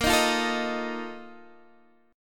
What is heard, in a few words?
Bb Major 7th Suspended 4th, chord; strummed